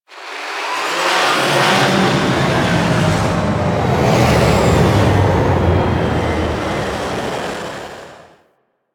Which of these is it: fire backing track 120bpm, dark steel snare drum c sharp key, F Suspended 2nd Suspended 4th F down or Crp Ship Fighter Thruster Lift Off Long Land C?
Crp Ship Fighter Thruster Lift Off Long Land C